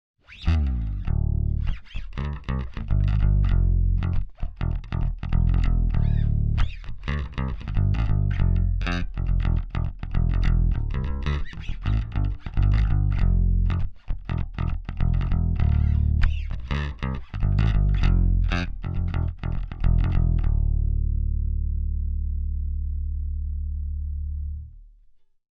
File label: BASS DI; 1073DPX